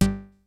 SEQUENCE BASS; Kid Nepro; Elite

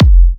Kicks F; markus hakala

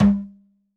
RACK TOM, ELECTRO KIT

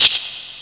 Shake; Urban Shakedown

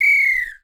SAMBA W, SAMBA WHISTL

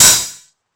909 DIST OHH; Wizoo - Platinum 24 Electronic

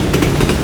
Train

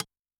PAD, Yamaha DTXpress-II snare

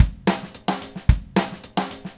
Red Maple Groove 110, DW Red Maple Kit; 110 BPM; Sonic Foundry (Sony Creative Software); Vital Drums

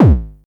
909-ish Kicks G#1, Tuned Kicks; LIMAT Div Dept; Drums

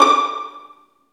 Vlns Pizz; Roland L-CD702; Vls Pizz

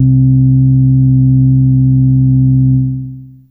Ahh; Humans2

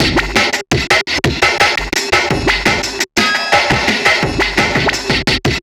DJ Beat, Drum N Bass; Sonic Foundry (Sony Creative Software); Knutrix Drum & Bass

